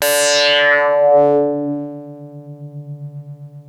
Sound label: Arp E D3, ARP Odyssey; musicradar-synth-samples